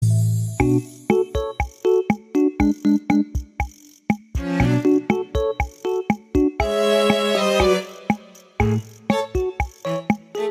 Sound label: wii music